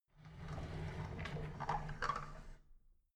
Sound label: drawer big clatter open soft